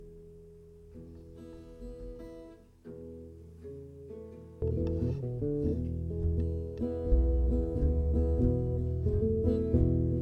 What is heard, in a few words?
Jam; r1 studio sessions